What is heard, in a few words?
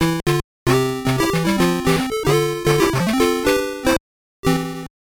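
Jingle, Super Game Boy; tama friends 3 choume kouen tamalympic game gear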